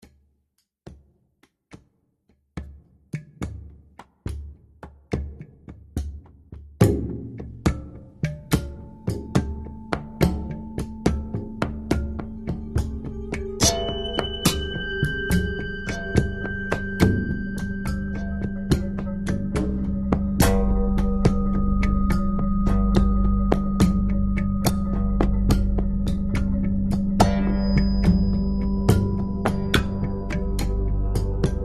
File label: Planning (Loop); The Walking Dead: The Telltale Series